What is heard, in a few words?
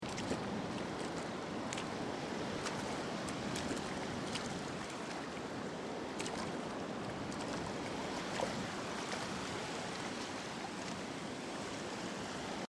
Ocean Beach Sound Of The Sea Footsteps In Deep Water; Footsteps In Shallow Water